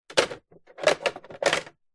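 cash register electronic paper roll plastic mechanism movement open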